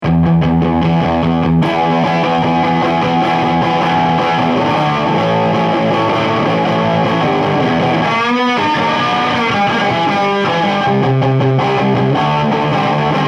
fuz moderate loose edge

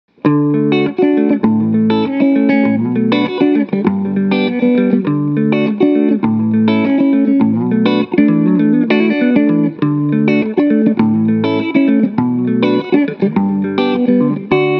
COMP-1 E4 Moderately High

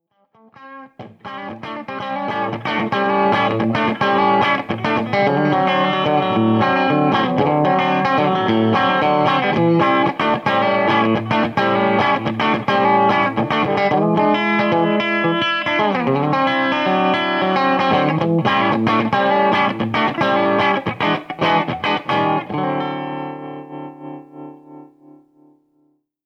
moderate compression edge

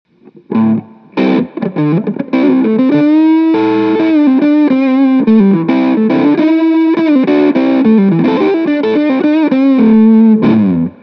Loose Medium Fuzz Medium Tone

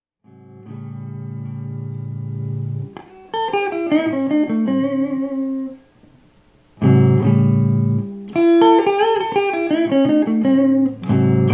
low compression

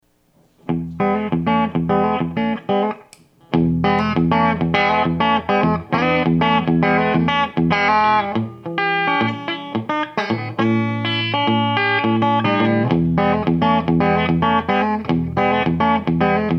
moderate compression

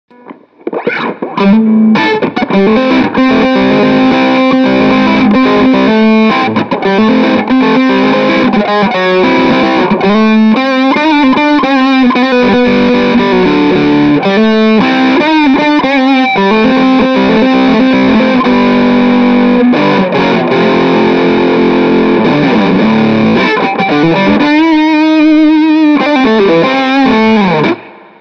E6 Tight High Fuzz Medium Tone

